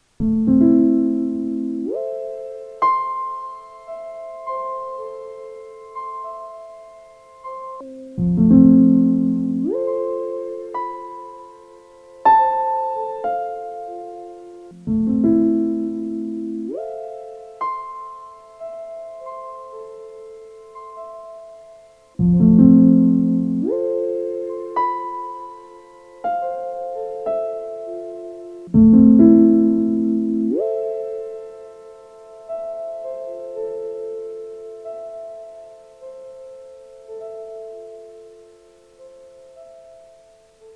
Arturia Microfreak piano sample engine

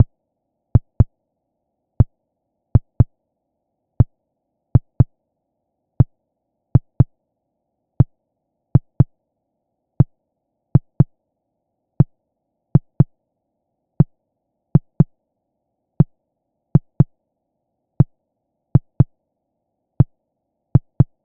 Moogerfooger Drum Env; Amazona Moogerfooger Workshop

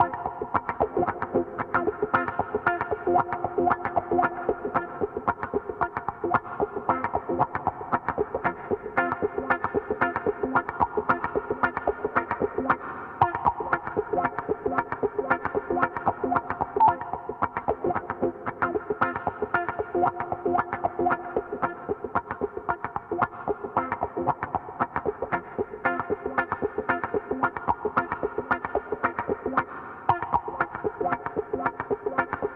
XPRESSOR 1973 50 WET gtr

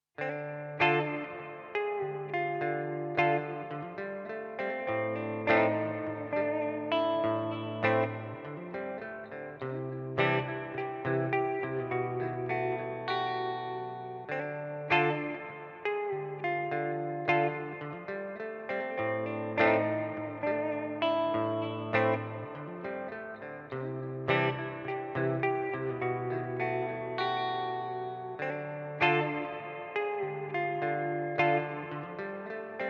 Plate Reverb Meris Mercury 7

